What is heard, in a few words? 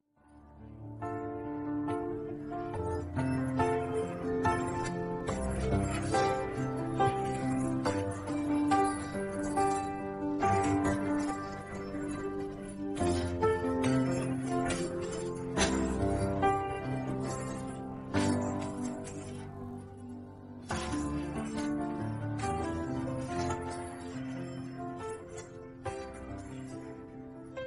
karaoke with lyrics, song; vishu2023